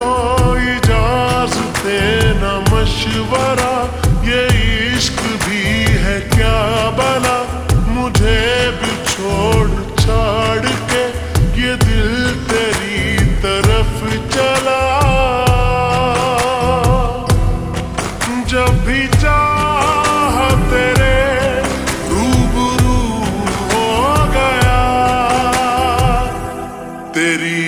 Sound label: Ringtone slowed reverb